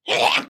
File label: death grunt; SoundsCrate